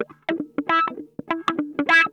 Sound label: MAD CAT, Funk Guitar; Zero G - Funk Guitar